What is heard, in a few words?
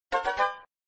Fanfare; flipper